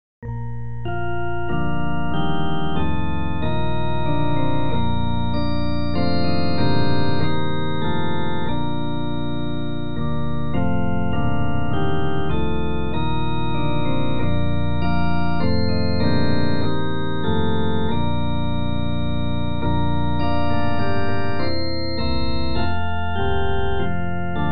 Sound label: instrumental hymns; all glory laud and honor